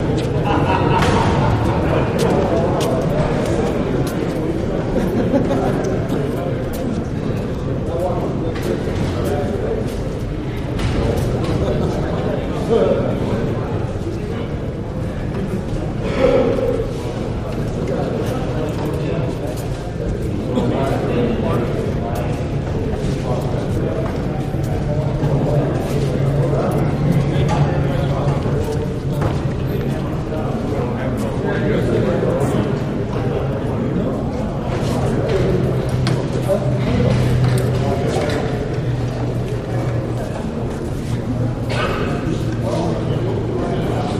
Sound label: Mess Hall Walla; hallways